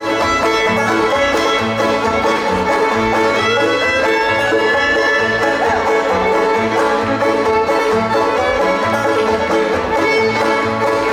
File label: Cotton Eyed Joe, musicbox